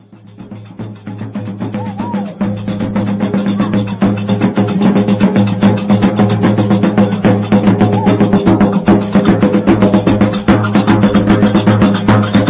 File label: gnawa